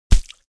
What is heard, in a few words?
hit; Viking Escape